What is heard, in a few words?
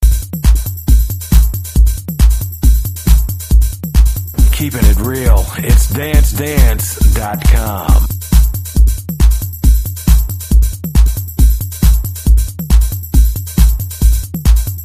robot rain, tech house